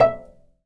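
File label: cello pizzicato B; AKAI S-Series CD-ROM Sound Library VOL-2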